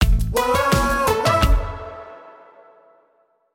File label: Sonic Logo; Pandora